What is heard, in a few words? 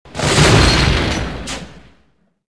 crash; Star Wars Jedi Knight II Jedi Outcast; XBOX; atst